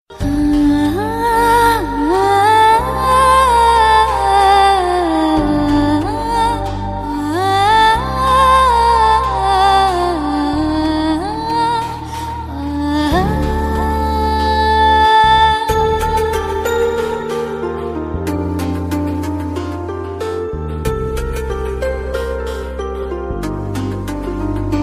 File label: soft hindi